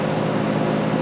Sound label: light machinery